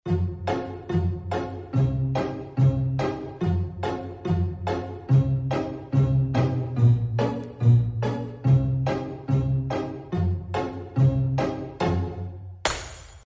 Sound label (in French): Accord